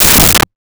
Radio Hit; Transitional Elements

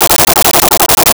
Arcade Movement; Video Game Sounds; 1001 Sound Effects

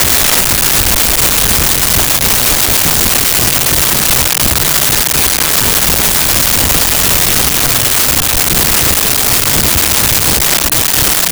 calling loop heartbeat; Rosegarden

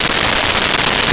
Satelite Burst Loop, Science Fiction

